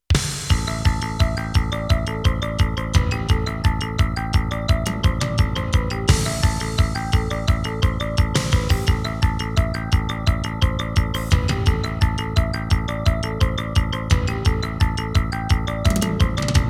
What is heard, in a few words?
Sound Saurus, Hammond GM 1000; After Burner Theme